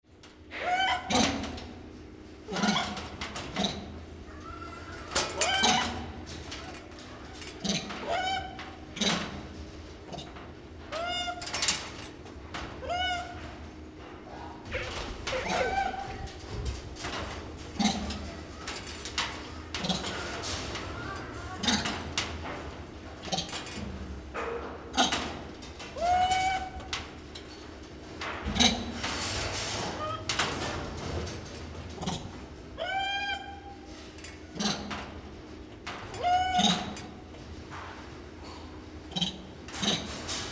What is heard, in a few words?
rhesus female juvies; a pair of female juvenile Rhesus Macaques react to microphone